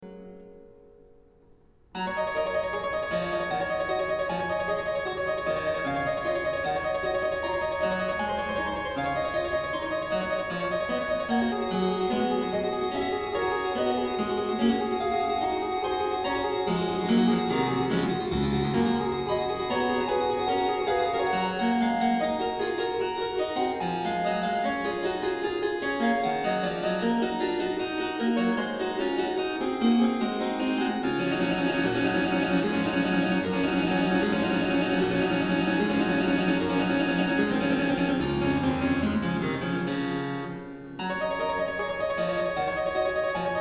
Harpsichord

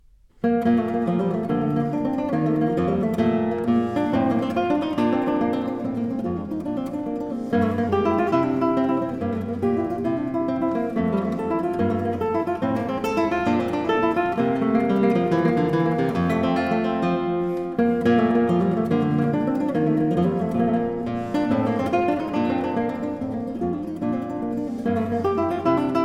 Cello Suite No 4 in E Flat Major, guitar, Gigue; Bach Transcriptions and Arrangements for Guitar

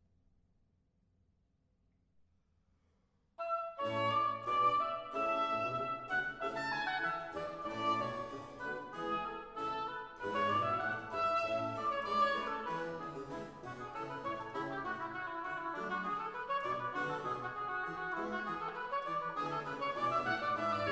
Cantata Arie; J S Bach; Wachet auf, ruft uns die Stimme; BWV 140

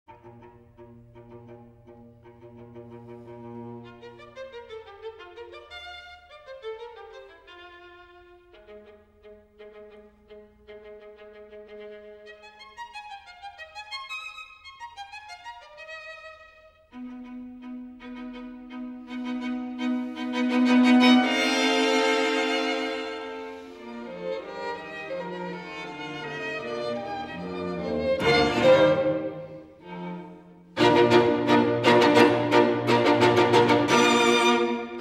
String Quartet in F Major, Allegretto; String Quartet No. 7; Op. 59 No. 1; Razumovsky; Ludwig van Beethoven; Beethoven - String Quartets Nos. 1-16

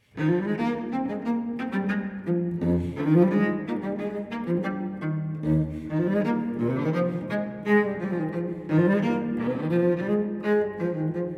Cello Suite No. 4 in E flat major Bourrees I II; BWV1010; Johann Sebastian Bach

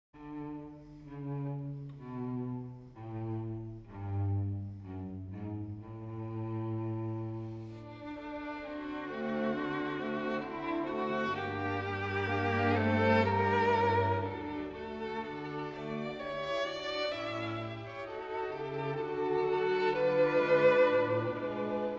String Quartet in F Minor, Allegretto; String Quartet No. 11; Op. 95; Quartetto serioso; Ludwig van Beethoven; Beethoven - String Quartets Nos. 1-16

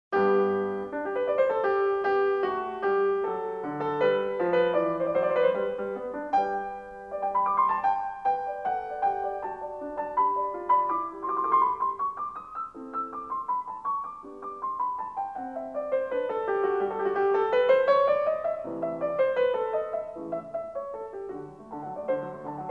Piano Sonata No. 20 in G major; Wilhelm Kempff; Beethoven; Piano Sonatas

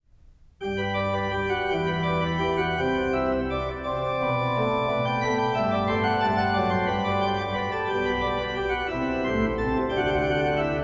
Chorale, Great Organ; Kommst du nun, Jesu, vom Himmel herunter, BWV 650 Schubler Chorale No. 6; Bach; Bach Great Organ Works; Johann Sebastian Bach

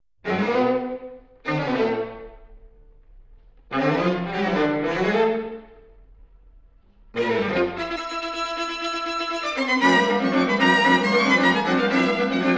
Quartet B Flat Major, String Quartets; Béla Bartók

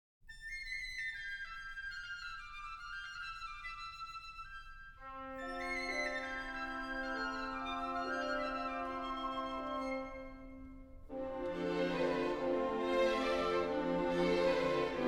Mazurka

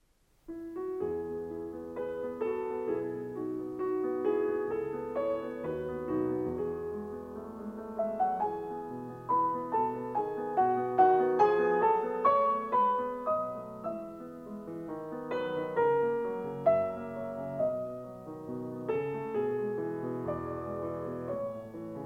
Piano Sonata No. 27 in E minor; Wilhelm Kempff; Beethoven; Piano Sonatas

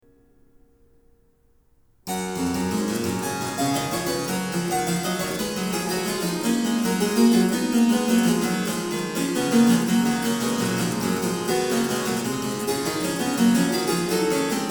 Harpsichord; Variatio 6. Canone alla Seconda. a 1 Clav; Bach; Goldberg Variations; J S Bach; BWV 988

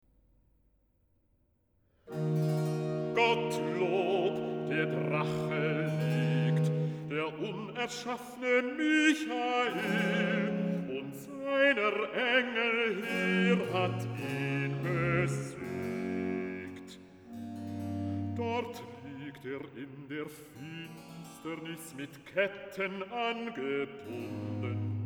Recitative; Es erhub sich ein Streit, BWV 19; Gottlob! der Drache liegt (Bass); Bach; Masaaki Suzuki

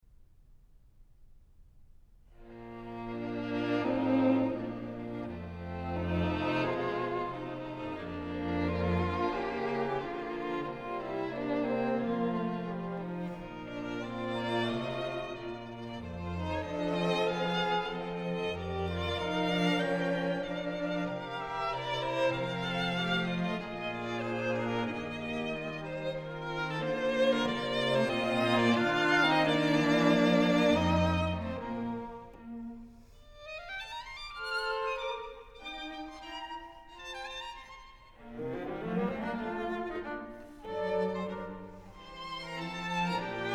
String Quartet No. 3 in D Major, Andante con moto; Ludwig van Beethoven; Beethoven - String Quartets No. 1-6, Op. 18

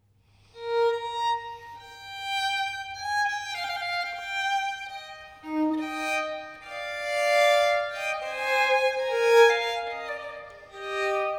Violin Partita No. 3 in E Major, Loure; BWV 1006; Bach

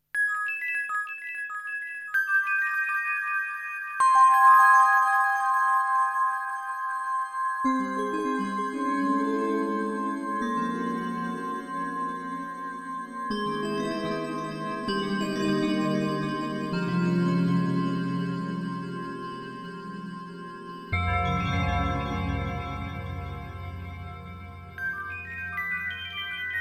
Bell Threshold; Worcester

